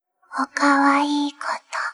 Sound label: applause